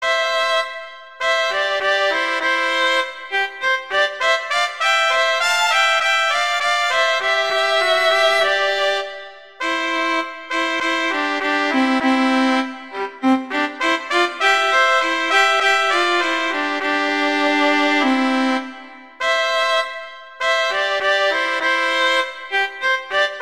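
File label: Duet, horn; Mozart; Duets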